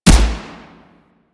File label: Shot; Warframe; Void Trader